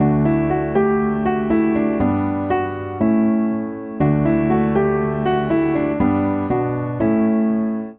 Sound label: Phrase I-IVmaj7-V-I-IV-vi-V D Major 120 bpm, Upright Piano; Friendly; Upright Piano by Spitfire Audio; Ableton; Factory Packs